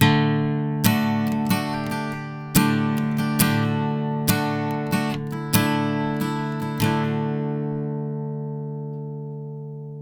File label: 70 Maj2 Chord D, Acoustic Guitar; Electric & Acoustic Guitar Loops; USB Soundscan vol.04; AKAI